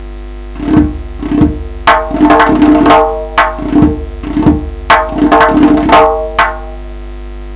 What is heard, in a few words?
7 beat rhythm